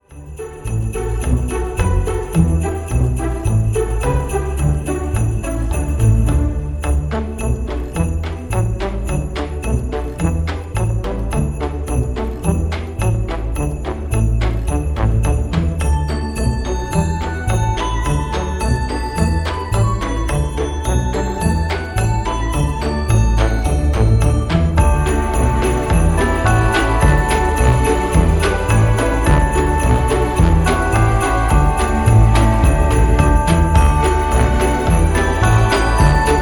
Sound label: music christmas; manic game